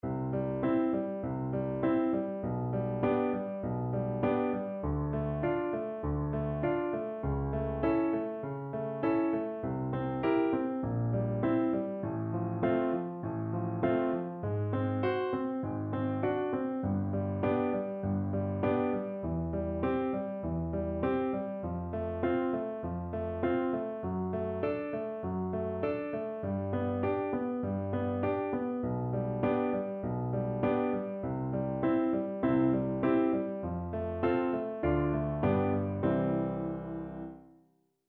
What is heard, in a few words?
Skips of 5ths Low voice accompaniment; Grade 3; Lesson 2